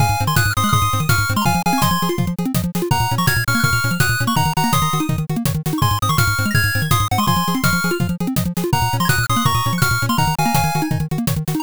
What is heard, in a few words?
bgm drive